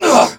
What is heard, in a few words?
pain, male; midnight guns; bootsector male 00